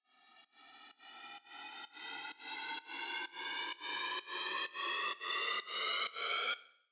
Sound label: Fx, Big EDM Drum Loops 128 BPM; Future House; Future House Tools